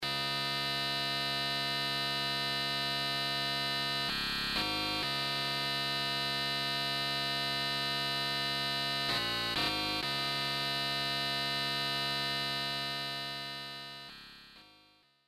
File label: multilfo synth dry